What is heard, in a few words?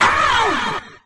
ow, Voice ooh ahh ow ouch